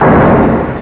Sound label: HAMMER